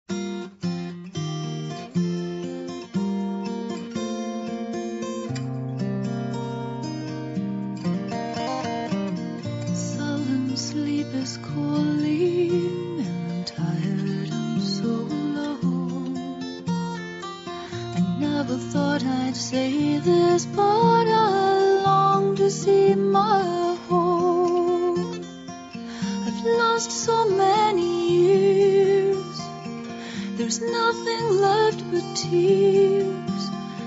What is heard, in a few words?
celtic worship